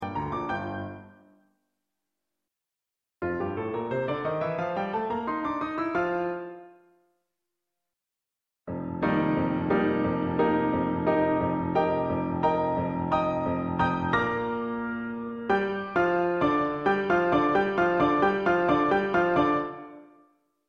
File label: Piano